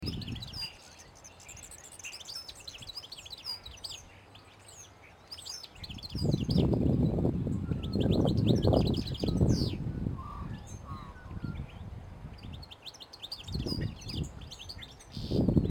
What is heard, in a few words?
Canary Voice